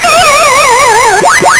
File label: dies; fy rota johnwoo; pacman